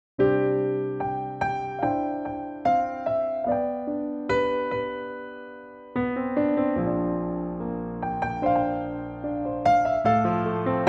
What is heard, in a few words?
C piano